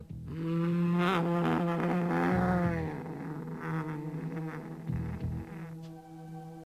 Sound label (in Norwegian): hufsa knurrer; mummitrollet